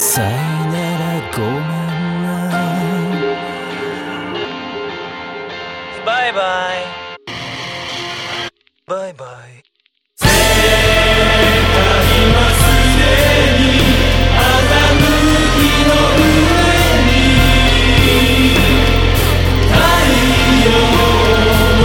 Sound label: Beat; Ichimaru Gin